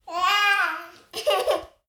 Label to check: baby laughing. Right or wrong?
Right